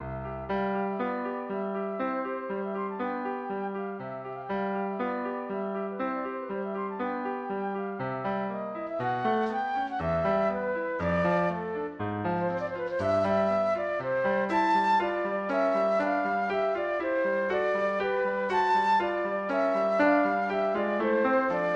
chopin nocturne, flute

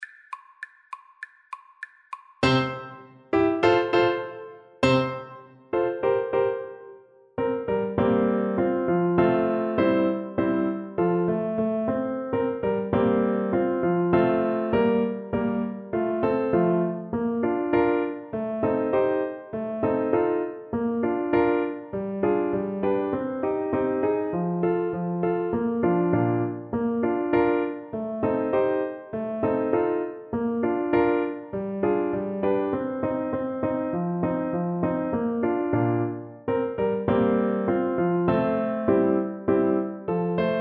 pizzicato polka, oboe

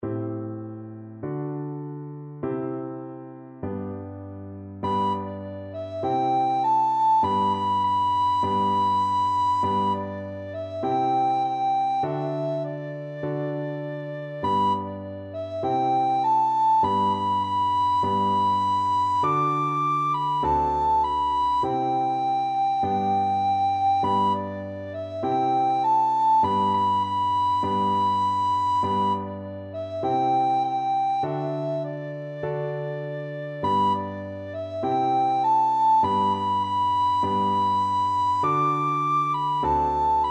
recorder

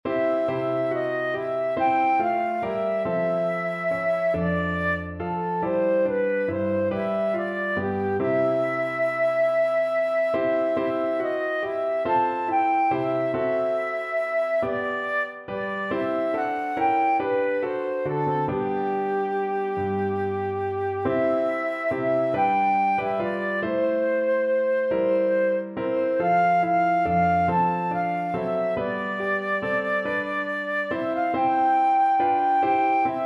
turn your eye, flute

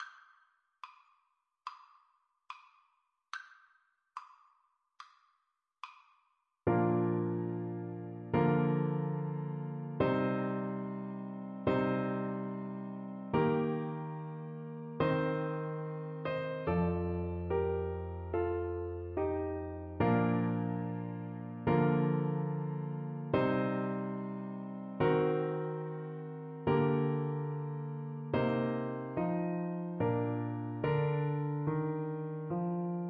deep river FL kar, Flute

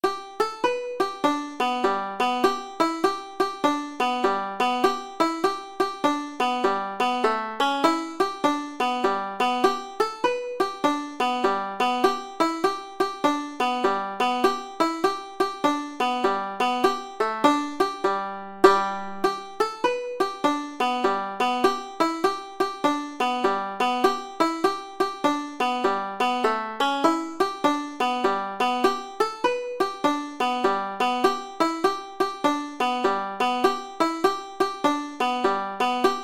banjo

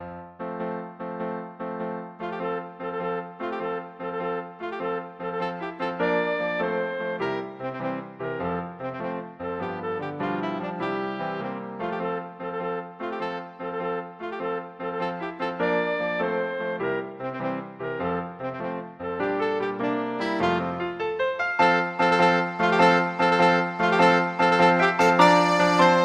saxophone

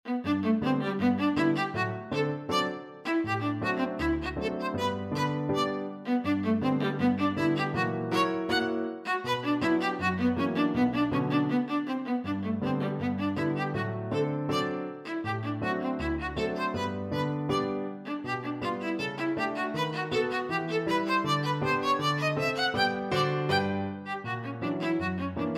pizzicati, viola

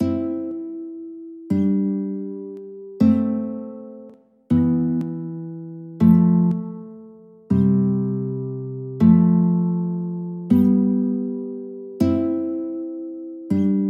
canon, guitar; pachelbel

